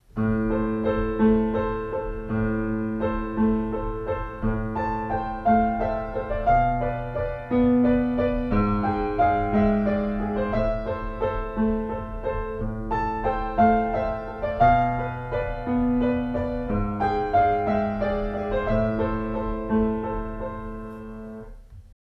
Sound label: Waltz Var